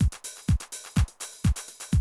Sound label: 125, Classic Drum Loops